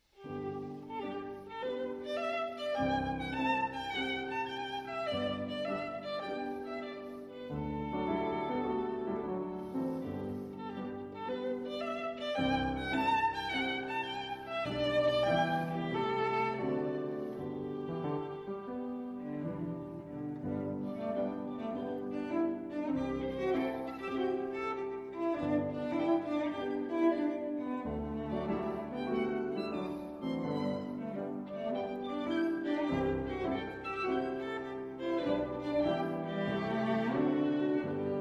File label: Humoresque No7 In G Flat Major Op101, Piano Trios; Dvorak